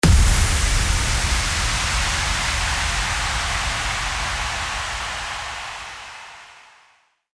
IMPACT

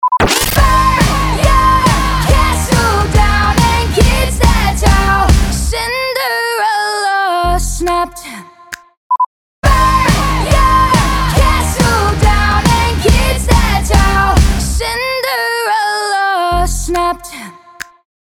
140BPM C#MAJ

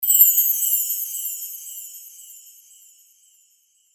CHIME

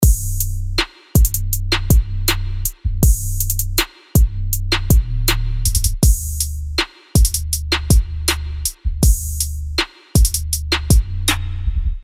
DRUM LOOP 80BPM

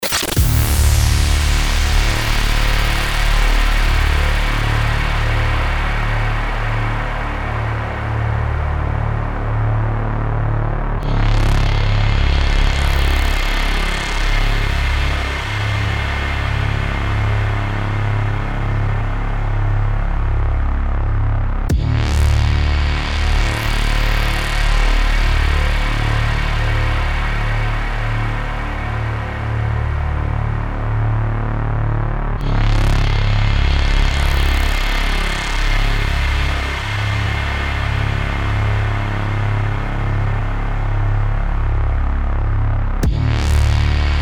DRONE, 90BPM Dmin

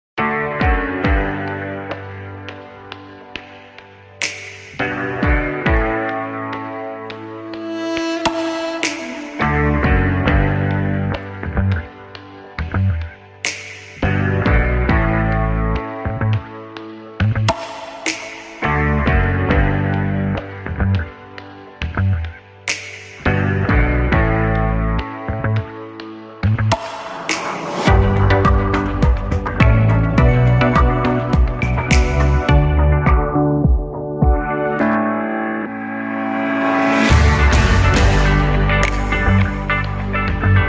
INST 104BPM KEY D

